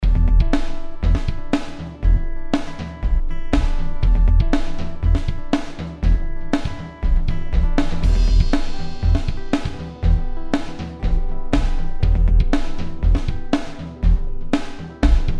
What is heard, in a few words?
Em120 Groove stem; Moonside